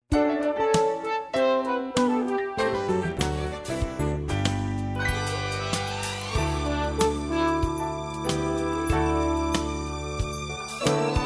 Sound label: Promo Bed